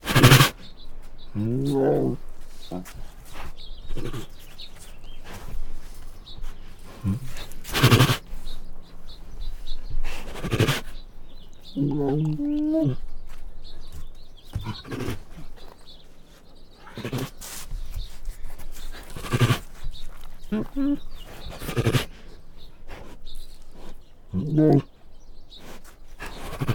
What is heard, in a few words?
Male Bengal Tiger Growls and Snorts; Tigers; Sound Ideas